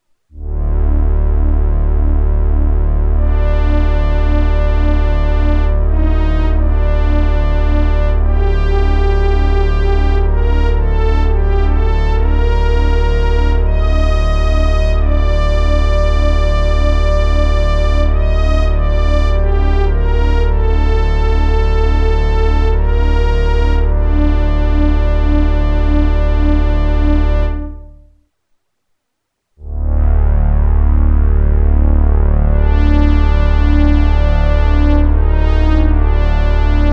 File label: Roland Juno106